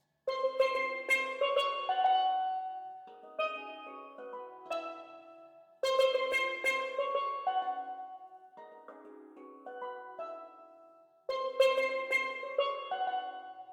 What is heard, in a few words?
steel drum